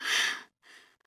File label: Pant; Lewd SFX